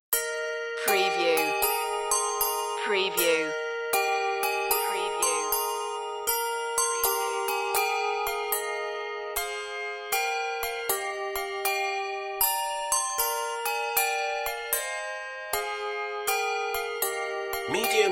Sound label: handbells; good christian men rejoice